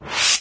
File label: inside your computer maximize; windows 9x; sound schemes